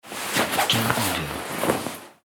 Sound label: Dressing Up